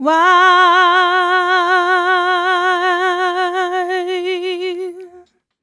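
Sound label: "uh" female, 126, f-sharp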